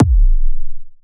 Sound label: Phase Plant, kick